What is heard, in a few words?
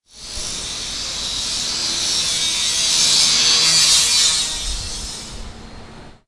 Foundry Grinder